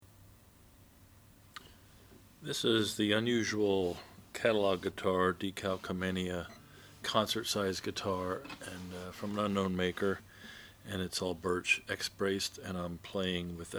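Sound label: red, Guitars; 1932; decalcomania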